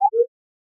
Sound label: owl notification; UI; 3maze; UI FX